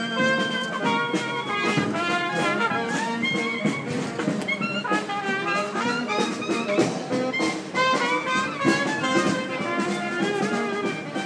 new orleans band at carnival of dirt

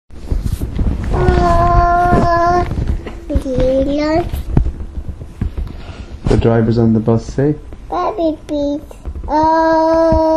singing in the bath

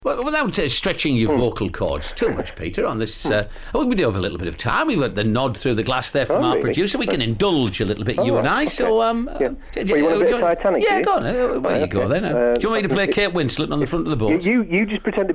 sings; on bbc tees breakfast

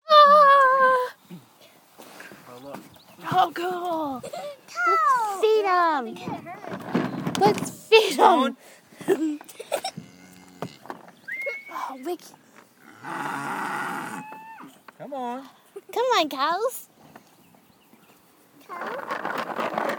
feeding cows and lots of cow noises; with my friends; cow info

